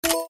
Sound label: reelspin click; Fairy Dust